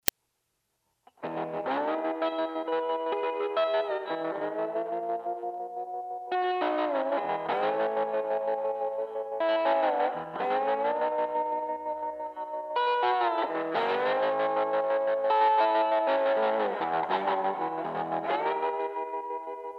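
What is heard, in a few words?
hodad fingers slide gain echo tremolo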